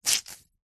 foley wet cloth squeeze dab squelch

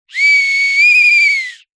whistle blow light; foley; zapsplat

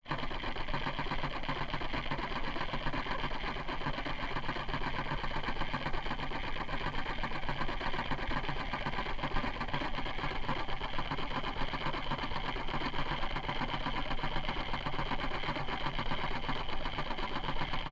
household clock mechanism whir

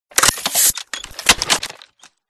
MECHANICAL MORPHS; PMSFX

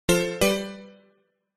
game sound synth stab warm bright alert

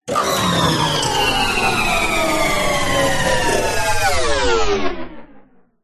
science fiction robot power down

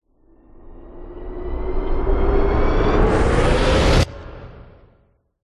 cinematic riser dramatic hard hitting tense; sound design

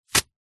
plastic bag thin small empty wet drop ground; foley

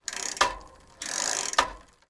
transport bicycle pedal sprocket

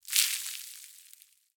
foley cigarette or cigar burn; zapsplat

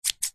office scissors large single snip; zapsplat

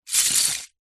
leisure lego pile movement

